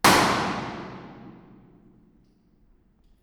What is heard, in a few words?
Shower